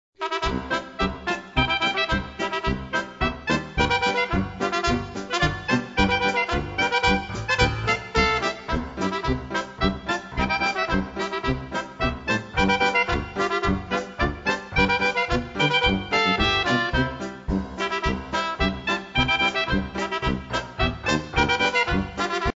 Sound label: POLKA